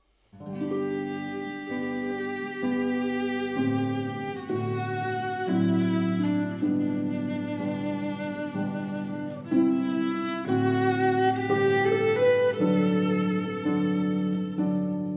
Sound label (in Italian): arpa violino flauto; O mio Signor; Haendel